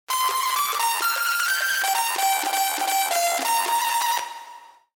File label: riff